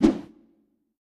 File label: Queen Attack swhoosh; we are the dwarves gamerip 2016